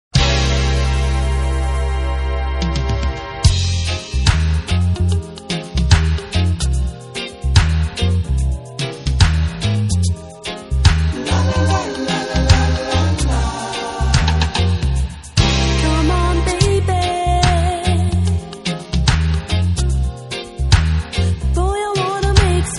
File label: reggae grooves